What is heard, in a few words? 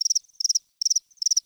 Prairie Day Close Cricket; Ambience